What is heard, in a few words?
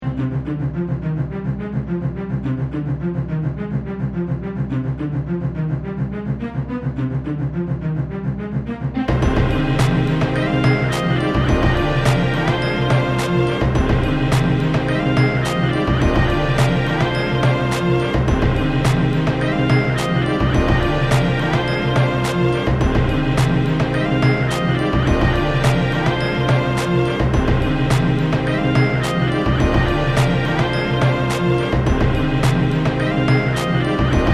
instrumental